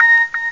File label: Little Blip